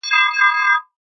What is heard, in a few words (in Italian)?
ciottolie reson; acque